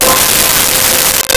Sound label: Warm Pad C5; Nintendo; July 2020 Gigaleak